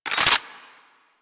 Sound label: Reload Complete, Weapons; Strun